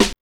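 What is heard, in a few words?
SD, snare drum; X-Static Goldmine 1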